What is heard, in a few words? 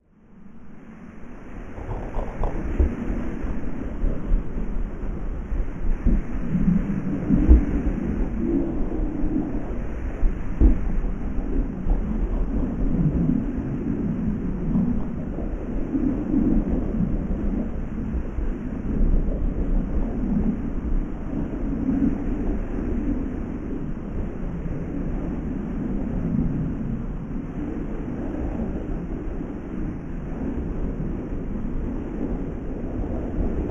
Ambient Noise Drone Effect; horror; Andrew Fleming